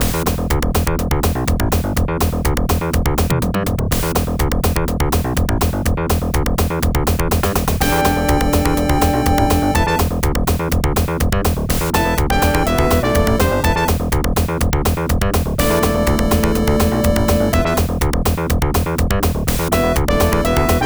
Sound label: Background (Plane); Junction; Genesis